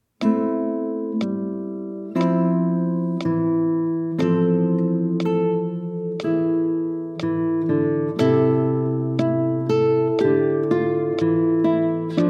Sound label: Aria, Classical Guitar; Classical Guitar Methods